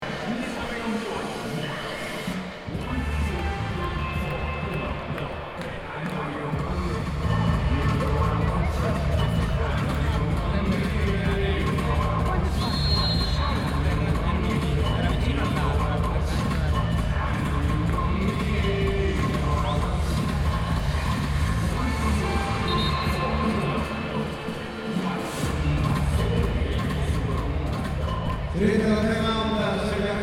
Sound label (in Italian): Mondiali di Pallavolo